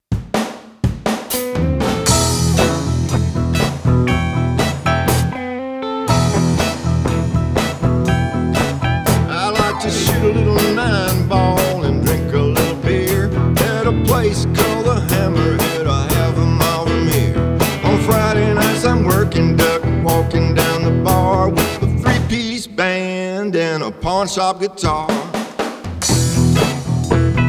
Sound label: pawnshop guitar, americana